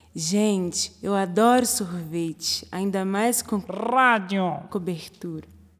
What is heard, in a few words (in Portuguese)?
vinheta